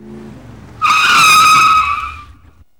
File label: SLAMMING, Car Skids